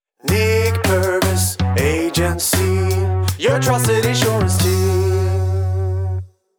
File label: Short Jingle